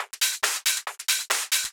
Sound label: Fusion Kit 138BPM Loop, Drums & Perc; A#minor; Vengeance Samples